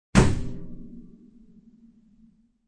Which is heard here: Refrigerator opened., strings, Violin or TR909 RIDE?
Refrigerator opened.